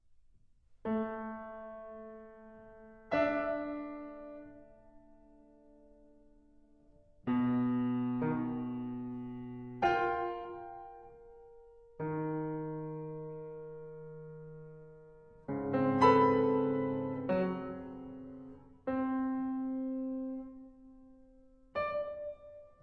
Piano Sonata; Miroir

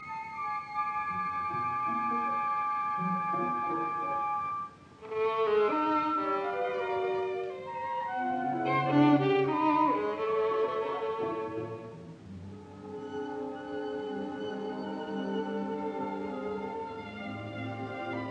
Violin Concerto Op 36 II Andante grazioso; Schoenberg

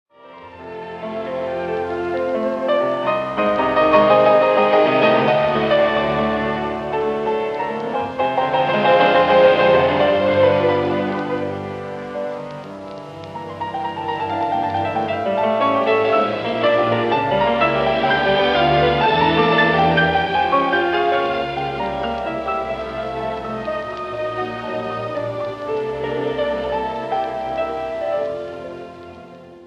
Piano Concerto in A minor, Allegro marcato; Grieg; Op. 16

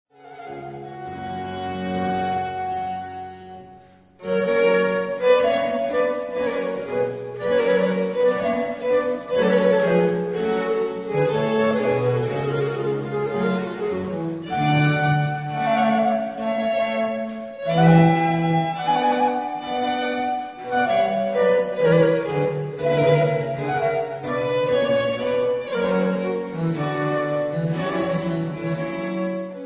for 2 violins & continuo, Courante; L'Espagnole (La visionnaire); Les Nations

